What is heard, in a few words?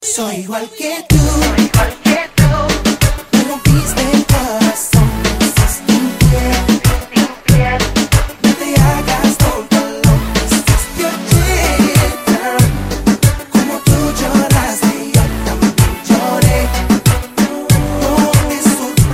ringtones reggaeton